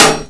metal computer impact bullet; physics; Garry's Mod; hl2

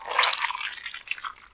crush guy; Emperor - Battle for Dune

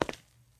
tile, footsteps; Garry's Mod; hl2

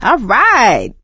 positive noise, survivor voice; Left 4 Dead 2; producer

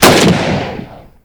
Mosin Nagant Shoot; Minecraft; Infamy; Last Alive Pack

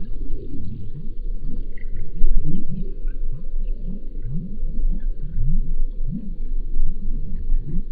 underwater; AssaultCube

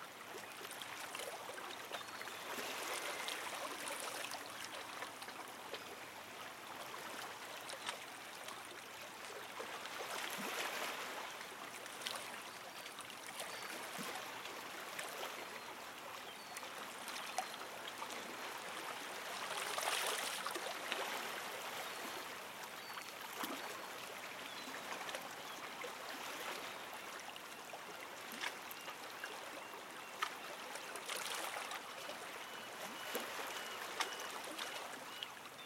waterfront; ambience; AssaultCube

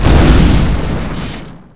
explosion; ordos; Emperor - Battle for Dune

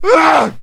man hit; Mount&Blade Warband

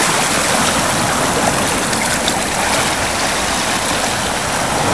sea loop; ambient; AssaultCube; Alien Stars; American Muscle Series

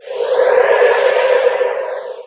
apc move start; Emperor - Battle for Dune

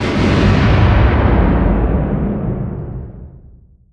distance super heavy battleship attack; Hearts of Iron IV Death or Dishonor

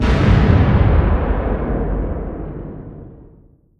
distance ships destroyer attack; Hearts of Iron IV Death or Dishonor

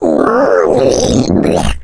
female boomer lurk; voice; idle; Left 4 Dead 2

